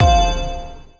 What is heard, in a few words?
stop respin; Amatic; Lucky Little Devil